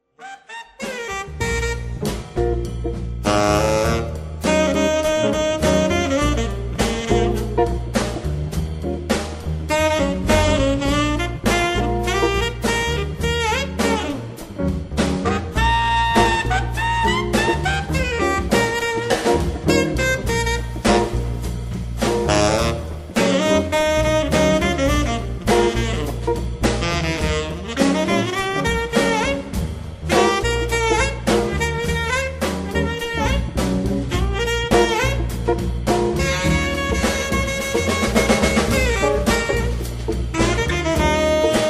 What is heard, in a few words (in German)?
Trio plus Sax Funk